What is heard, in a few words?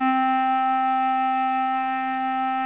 CLARINET 60; Dark Forces Demo; Dark Forces Instruments